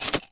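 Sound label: parking meter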